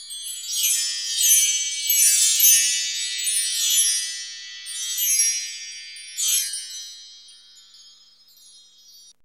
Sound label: WINDCHIME, PERCUSSION; AMG - Vince Clarke Lucky Bastard